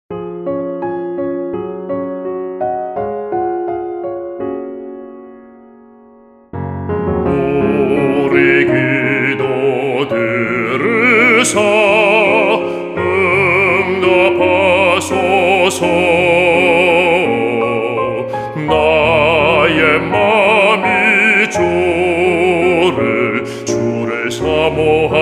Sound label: BASS